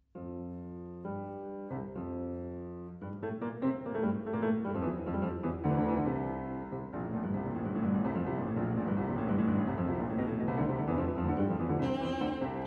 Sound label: piano trio; bargiel; 4th movt